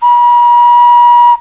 kitty, whistle

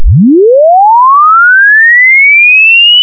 chirp Synth 8000

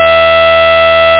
saw, sines

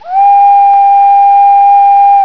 bluebird whistle

